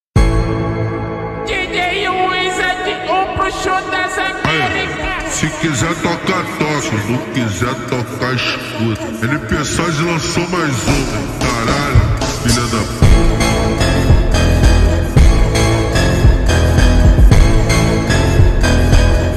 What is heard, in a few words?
phonk slowed reverb remix